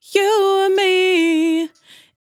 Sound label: 100bpm Bm, Velvet Vocal; Zero-G